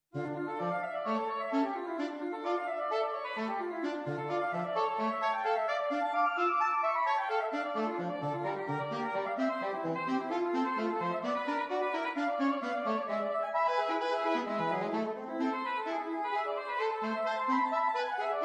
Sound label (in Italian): x 2 sax; Solfeggietto